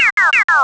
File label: ufo